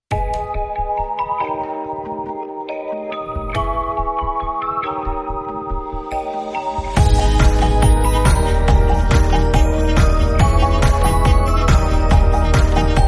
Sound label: Inst